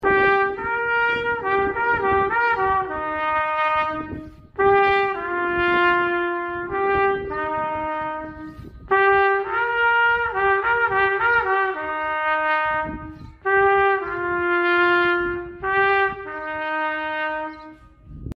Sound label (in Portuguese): trompete